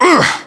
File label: pain, voiceovers; localized english